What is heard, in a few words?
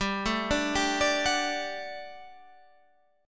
G Major 7th Suspended 2nd G down